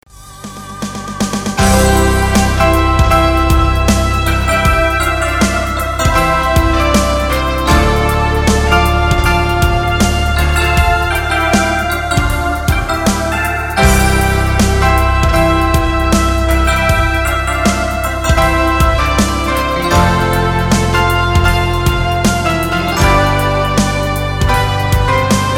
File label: lullabye